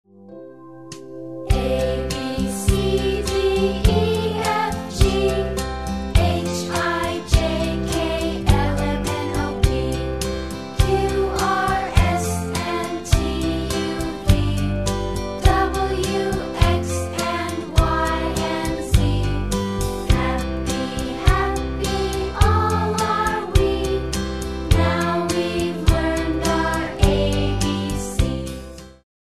instr alphabet song; karaoke; disney; childrens favorite songs

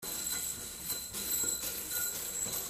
slinky walking; toystory

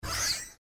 small rollover; disney; bh6

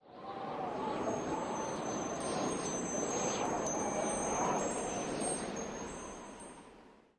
clouds part; princess

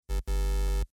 wrong sound; toystory; mr potatohead

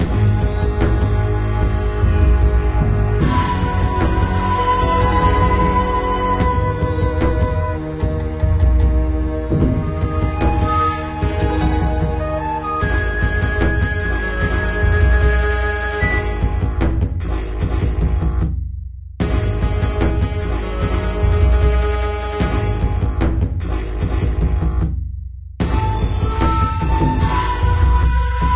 gameplay; john carter; lost symbol

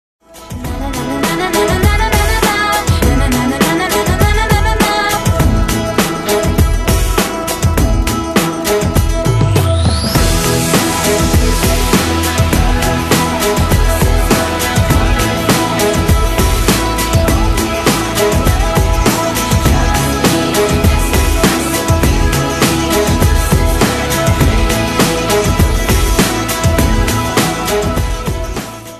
karaoke; disney

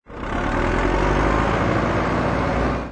Drive; disney; big crane game

